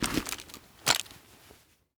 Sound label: sawnoff shotgun deploy; Rust